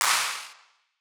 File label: Wider Clap; stereo toolkit